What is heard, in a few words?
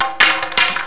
wood pole; IMPACTS